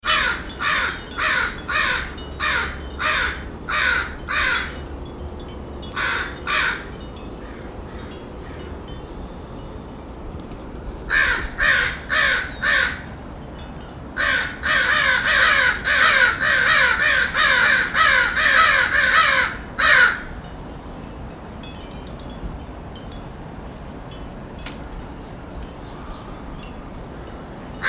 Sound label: crows and wind chimes, Portland lockdown sounds